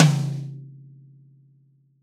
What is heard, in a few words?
Jazz Mid Tom, Roland BK-5